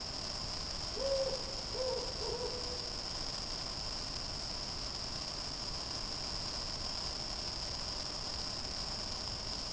Mourn Ext Amb N; The Elder Scrolls III Morrowind GOTY; XBOX

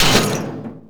land metal, terrains; Tony Hawk's Project 8; XBOX

